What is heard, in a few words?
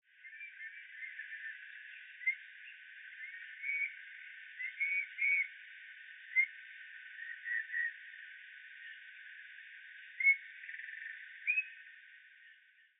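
Eurasian Bullfinch, muroran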